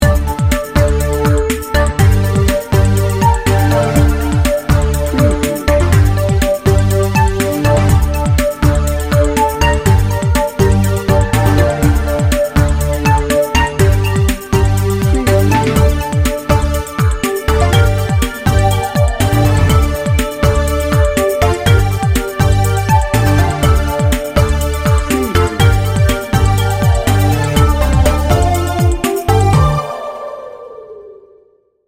futuristic vision

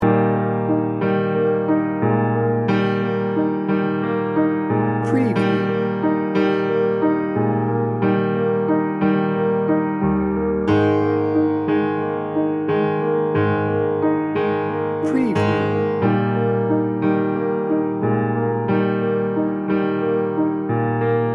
dreamy piano loop

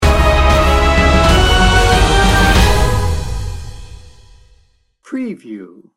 cinematic power sting bumper

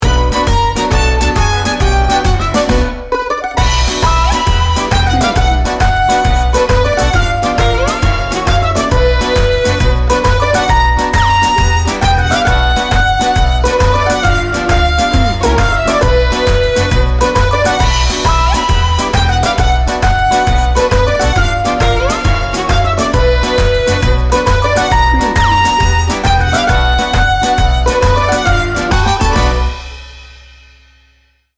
tarantella frenzy

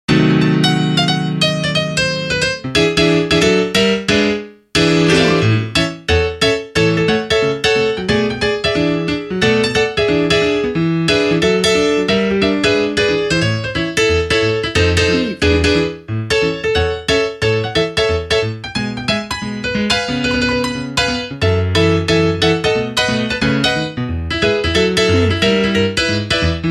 ragtime piano only

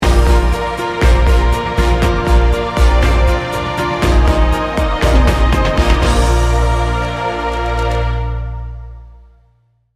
cinematic emotions sting bumper

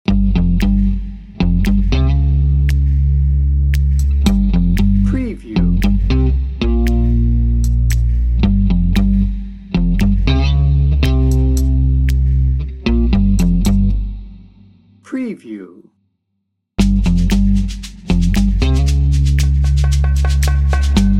perc bass low guitar

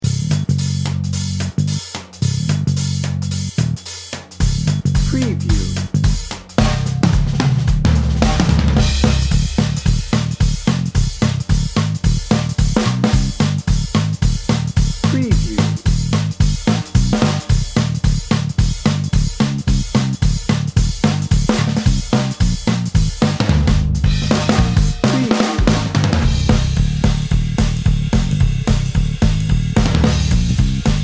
jitterbug jive dnb

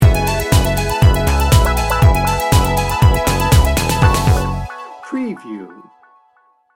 moody dancer sting bumper

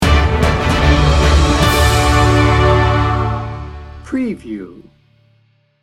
majestic triumph sting bumper

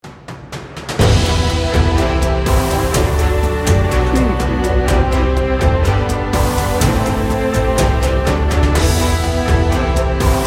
travel quest

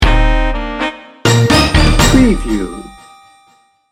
swagger groove sting bumper

